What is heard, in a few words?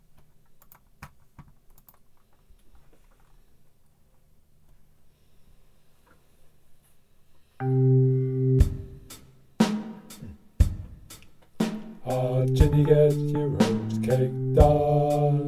Whip Guide Bass; singalong track; Whip Jamboree Bass singalong track